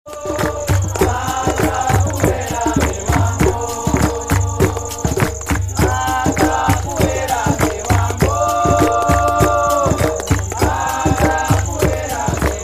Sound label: live music brasilian night; sadana forest